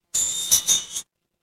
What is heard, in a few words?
feedback success